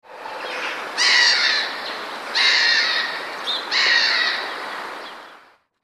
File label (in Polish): smieszka, prawdziwa mewa śmieszka